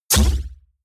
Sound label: sci-fi hissing blast; comedy-cartoon; pistolen-gewehre